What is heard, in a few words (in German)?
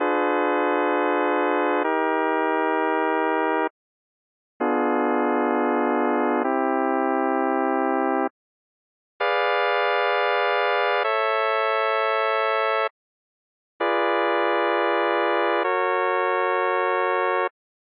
vermindert nach es c a fis